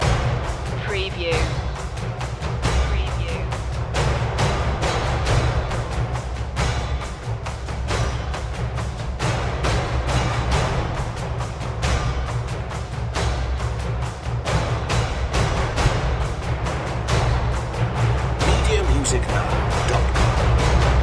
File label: perc loop